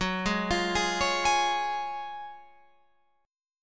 F sharp Major 7th Suspended 2nd, F sharp, down, Guitar, Open F; arpeggiated; JGuitar